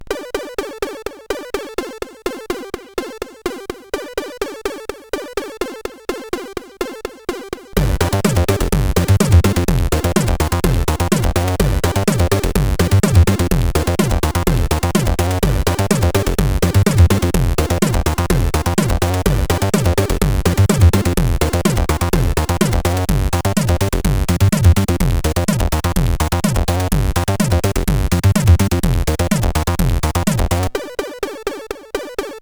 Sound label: Total Vibration, CSG8580R5